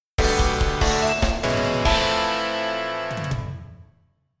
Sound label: jingle